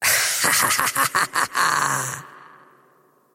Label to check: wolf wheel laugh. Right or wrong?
Right